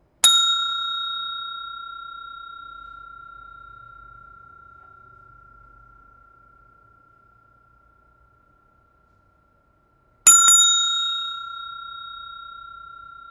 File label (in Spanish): TIMBRE de HOTEL